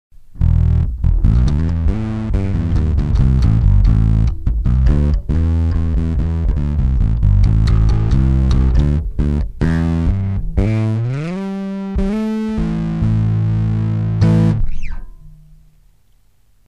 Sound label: chaos, fuzz; ak-workshops